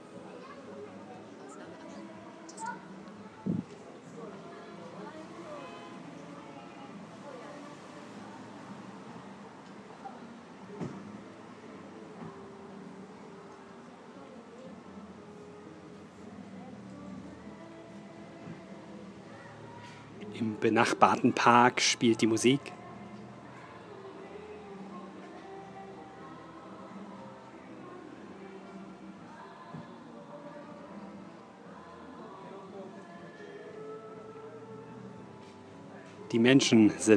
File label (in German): elba tanzmusik am abend